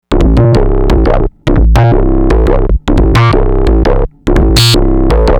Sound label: Synth, Drum n Bass; KJ Sawka; Drum n Bass & Beyond